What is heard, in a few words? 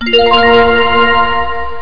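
lightning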